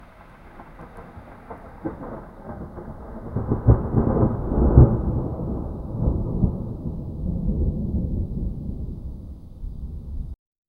sharp crack of thunder followed by distant rumbles